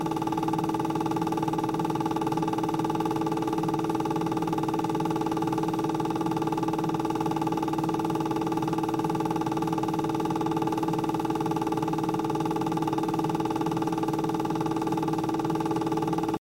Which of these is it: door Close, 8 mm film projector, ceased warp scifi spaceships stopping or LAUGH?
8 mm film projector